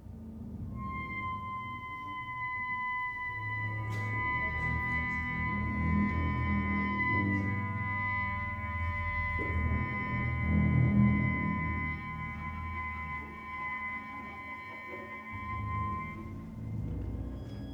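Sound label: Clarinet multiphonics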